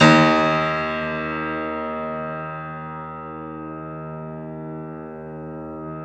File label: Bosendorfer; Optical Media International - Sonic Images Library